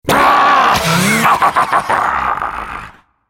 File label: laugh; Khazix